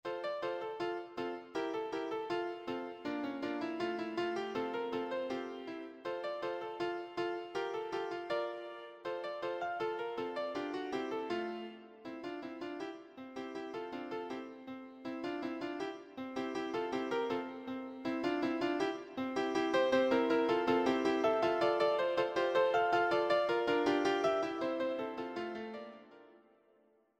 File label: Sekolo Se Koetsoe audio sample piano